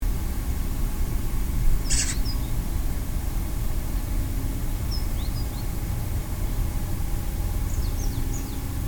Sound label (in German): elster